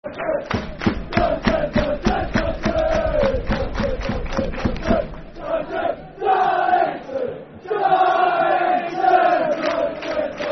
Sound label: Football Chant, Darlington; Darlington Dfc